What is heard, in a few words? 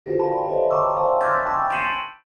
Old vintage cartoon ascend